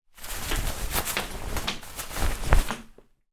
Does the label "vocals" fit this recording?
No